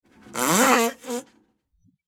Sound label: funny farting cat